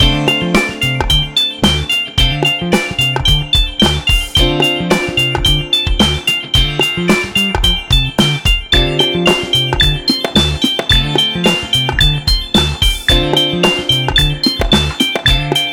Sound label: Funky Loop